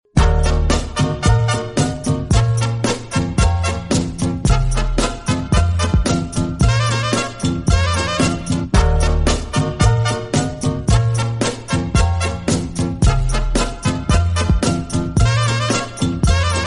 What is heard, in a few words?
mexican cha cha tune ringtone